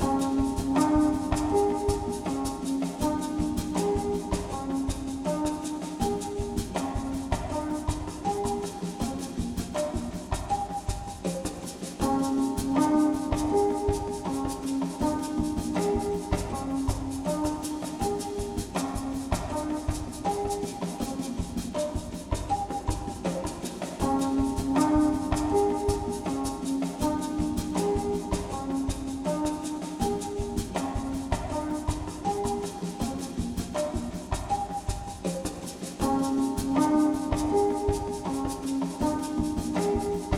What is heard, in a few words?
unease mellow